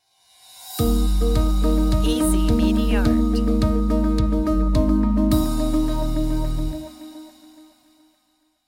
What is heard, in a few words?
calm corporate logo